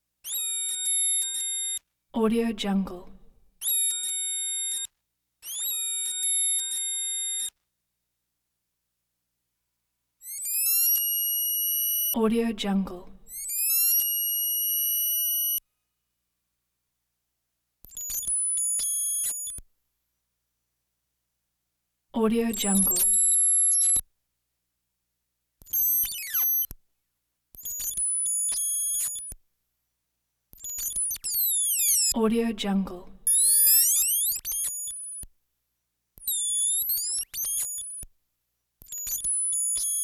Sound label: power on clicky twiddly video switching; prosoundfx